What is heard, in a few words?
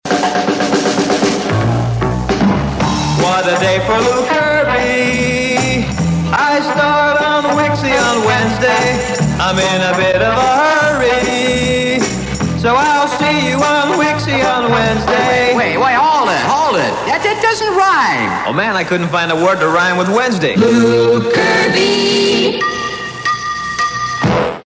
Promo Song